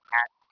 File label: hat Tone